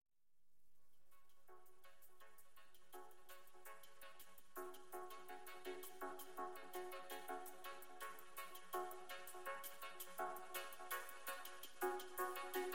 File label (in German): Spielglocken